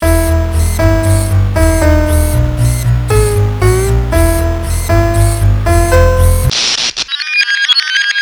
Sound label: mounted fish song; tf2